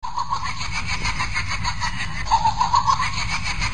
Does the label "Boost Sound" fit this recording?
No